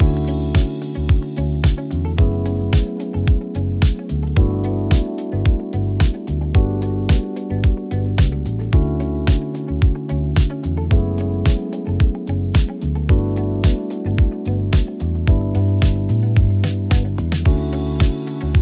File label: salon chic 8khz ulaw, hold music